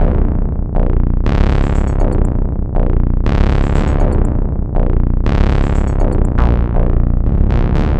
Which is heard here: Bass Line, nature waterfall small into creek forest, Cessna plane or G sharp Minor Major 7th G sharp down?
Bass Line